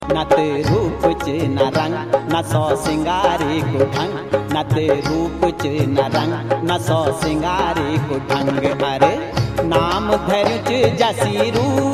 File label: Garhwali Ringtone